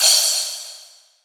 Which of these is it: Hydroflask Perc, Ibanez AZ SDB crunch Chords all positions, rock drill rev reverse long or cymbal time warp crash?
cymbal time warp crash